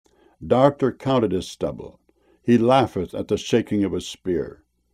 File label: laugheth